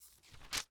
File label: Household Book Telephone Book Flip Page